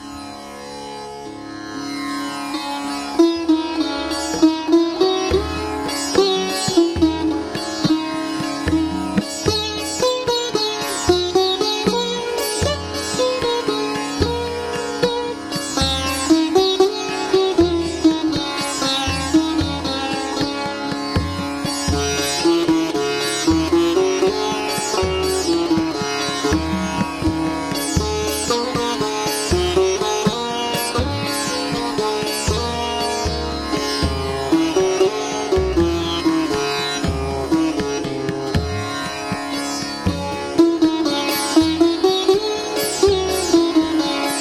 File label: sitar tampura tabla